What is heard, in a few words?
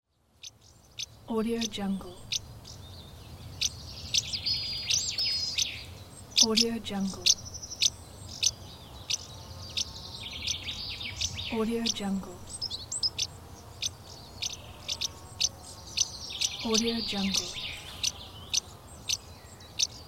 nature ambience